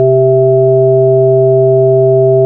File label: SOUL ORGAN, FUNKY ORGANS; Zero-G; Funky Elements